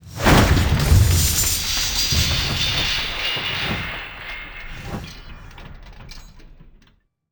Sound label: Rotor Strike; DCS